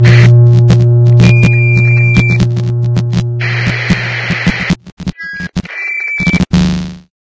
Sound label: transmission begin; ambient; source engine; ep2; outland